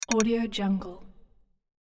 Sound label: interface triple snaps thin; interface sounds